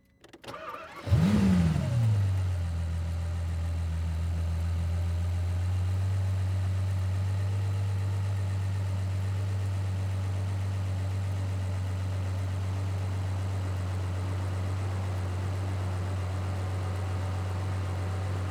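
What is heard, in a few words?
Ferrari, 812, Onboard, Start, Idle, Steady, Blips, Off, Engine, Mix; Superfast 2018; Pole Position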